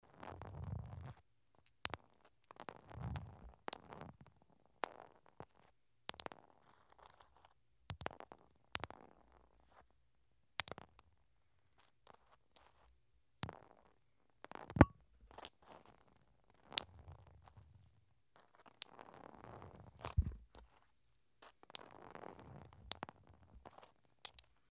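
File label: bidama, glass marbles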